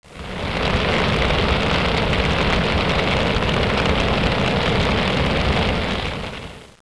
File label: candle